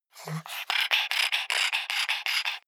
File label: pug pant; natur-tiere; hunde